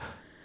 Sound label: techno